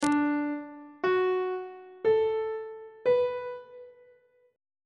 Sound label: e double flat major 6th chord intervals on treble clef